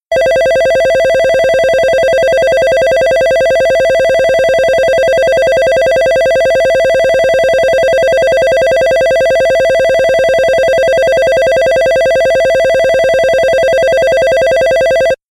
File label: Bell B